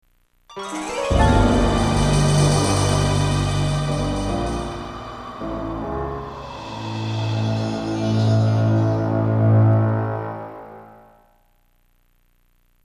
eerie; Indiana Jones and the Infernal Machine

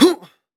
Jump Grunt; Afterbern Democralypse; Windows

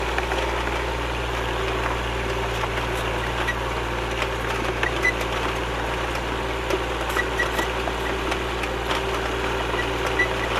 sound Spike Wheel

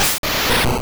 Mach Punch; sfx; attack moves; pokemon; gen 2; gsc

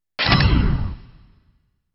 Gate Hacking Menu (Error Sound); dot hack

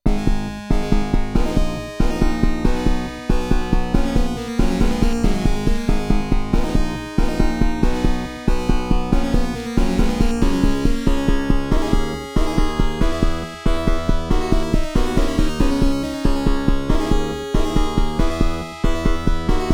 ballad; Arcus; Sharp X1

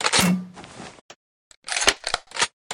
Shotgun Reload; lethal company; ambience; windows

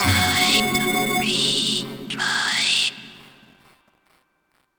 Retry Jingle

